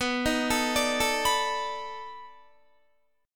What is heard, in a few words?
B 7th Sharp 9th B, Guitar; B7#9 arpeggiated; 4F,4A,5C,5G,5C,6E; chord shape; 18,18,21,19,21,19; JGuitar